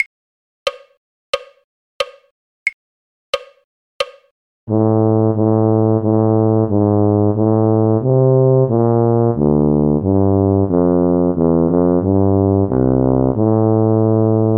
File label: tuba; carols